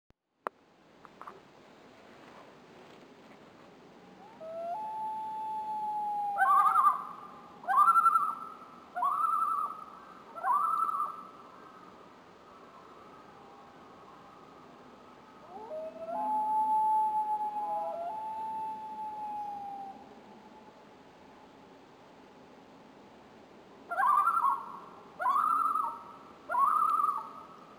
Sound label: loons calling at night; Mere Lea